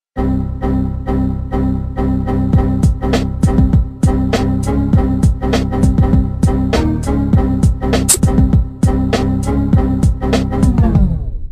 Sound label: windows error remix